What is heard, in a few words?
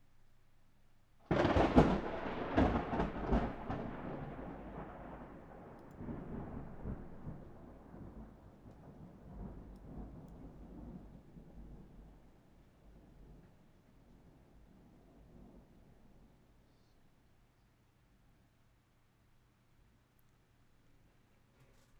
Thunder